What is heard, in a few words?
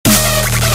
Dubstep